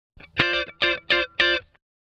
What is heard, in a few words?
105 Cmaj SUGARFUNK GTR; 105 BPM